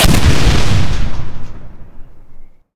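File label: explosion generic medium; props; explosions; Portal 2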